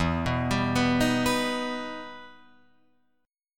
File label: E Minor Major 7th, Guitar, Open F; EmM7 arpeggiated; 4,6,7,6,6,6